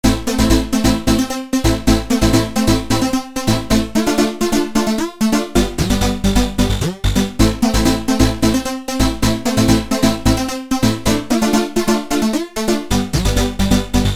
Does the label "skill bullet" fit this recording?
No